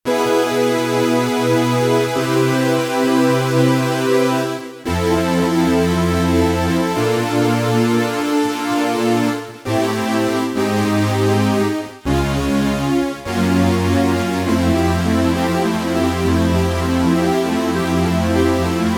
Kawai K1 echo poly

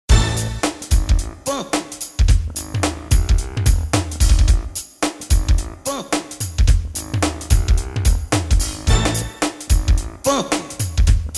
funky

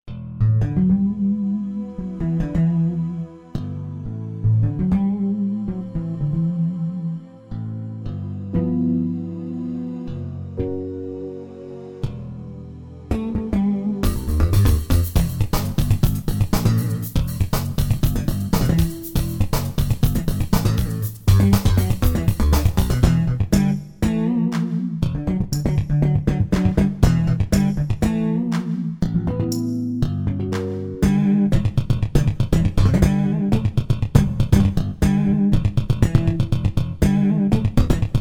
bassics

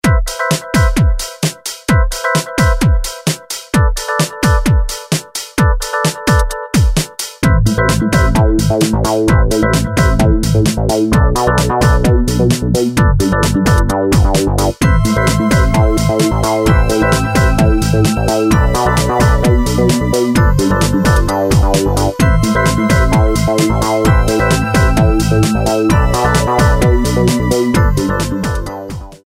beat

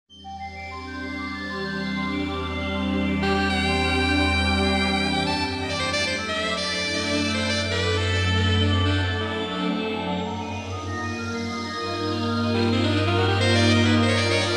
funk

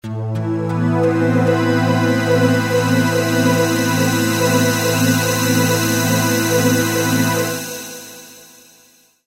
evopad

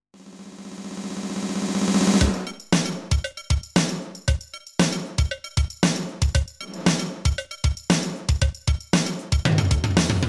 drm song

